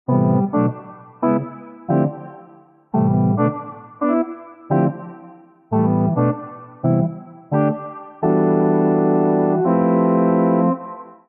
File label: Pan Party EP D Minor 85 bpm; MIDI Clips; Tonal; Accompaniment; Ableton; Factory Packs; Chop and Swing